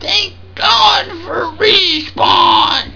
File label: death; Alien Arena; drfreak